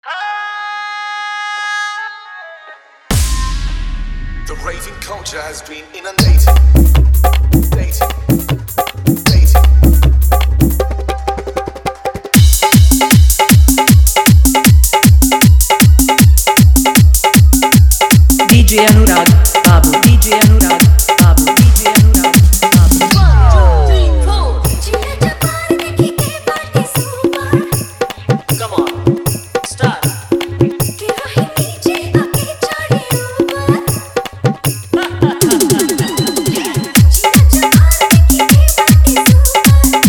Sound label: bhojpuri hit DjSong Fulyy DhollkiBass